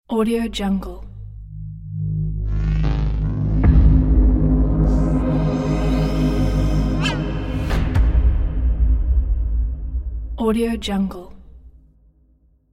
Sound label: dark logo; wise-band